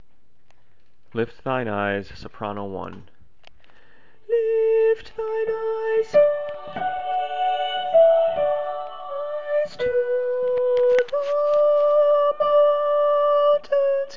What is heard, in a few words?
sop 2; Lift Thine Eyes; rehearsal